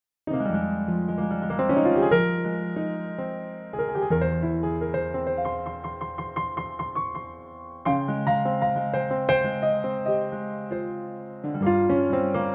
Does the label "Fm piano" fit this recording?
Yes